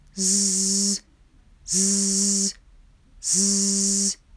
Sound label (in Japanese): 子音Z短い